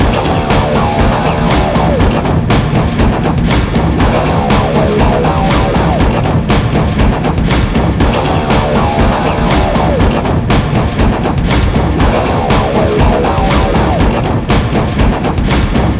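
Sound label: Jack fight Music Loop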